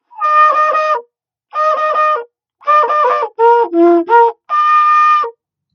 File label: herald Horn